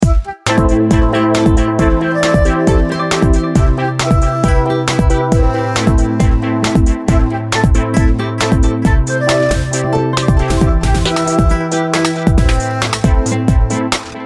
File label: Doom Swing